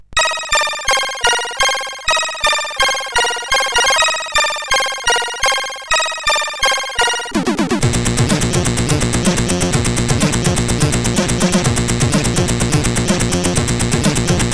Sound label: Intro Music II, CSG8580R5